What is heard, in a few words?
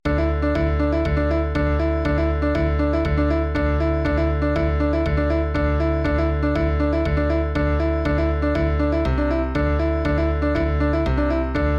Beat MIDI